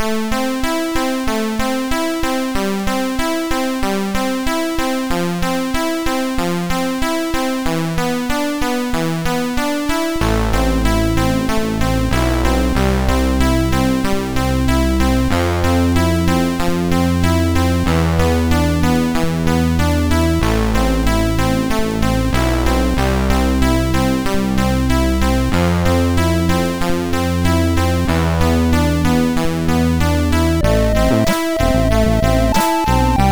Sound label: sid, MOS6581R4